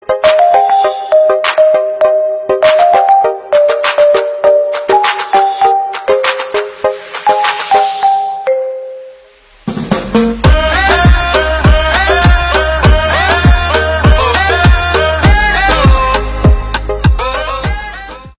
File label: Marimba